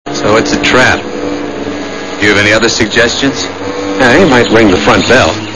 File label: ring bell